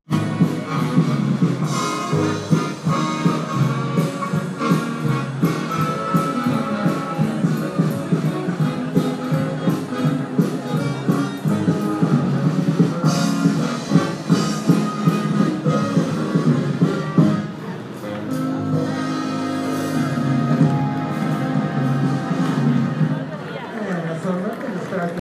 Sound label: Old Time Music Outside